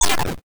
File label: lazer shoot